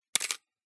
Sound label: Camera Film 35mm SLR CANON A1 Shutter Release 1 60th; MKH8040; PMSFX